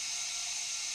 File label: spray; Mindustry